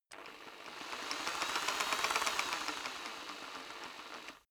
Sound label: rock drill hammer speed up slow down soft; maschinen; bohrer; soundpool